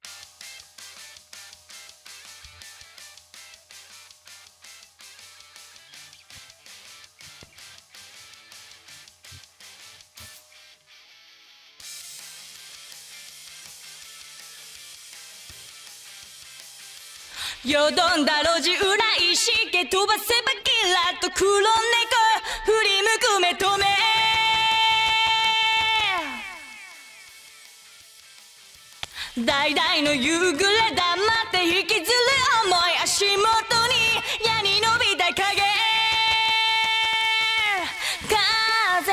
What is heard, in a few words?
Acapella; Yorinuki Gintama-san